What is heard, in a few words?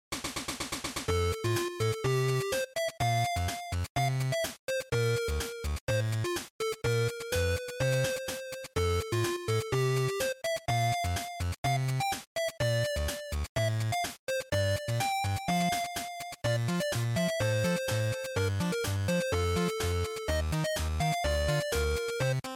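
Game Boy Action Stage, bgm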